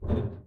barefoot metal; Apotheorasis

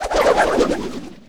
Swish Twin Sword Twirl; Weapons

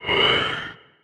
Pickup Magic Speed; Pickups

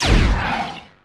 Menu Scifi Select; Menu FX

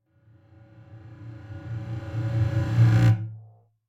Whoosh Reversed Fast Pulsating - Reverse; Hollywood Edge; sound designer's toolkit v2

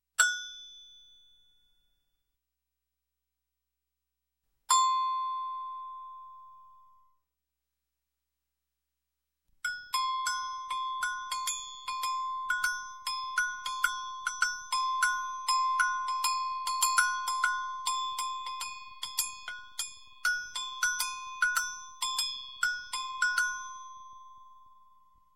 Agogo Bell single Hit, Music, Percussion; Sound Ideas; Series 2000

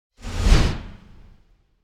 Whoosh By Classic Deep And Fast Passing; Hollywood Edge; sound designer's toolkit v2

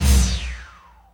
Menu Select; Menu FX

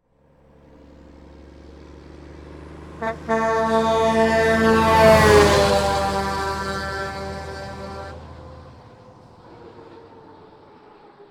Semi Truck Pass By; Large Vehicles; Blastwave

